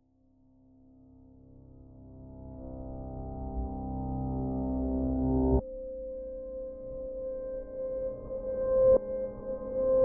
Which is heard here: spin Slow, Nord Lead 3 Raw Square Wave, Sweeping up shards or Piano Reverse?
Piano Reverse